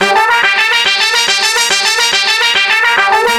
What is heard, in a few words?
Horn Sect Effect; Sonic Foundry (Sony Creative Software); Jazz Solos and Sections; Effected